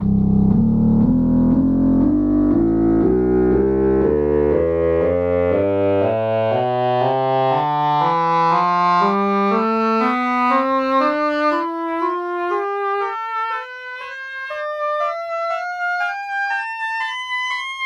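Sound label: english horn scale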